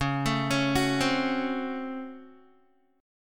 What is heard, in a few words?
Db Major 7th Suspended 4th Sharp 5th Db Guitar; 9,12,11,11,12,x; DbM7sus4#5 arpeggiated; JGuitar